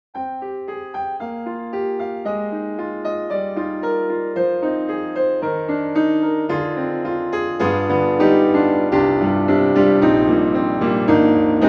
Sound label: Ravenscroft 275 Room Mic; VI Labs